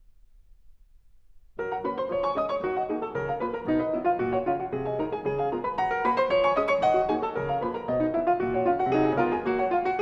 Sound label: Etudes for piano, in G flat major, Allegro assai; Chopin; Etudes op 10 25